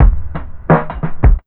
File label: DRMSND, Underground Hip Hop, BEATKIT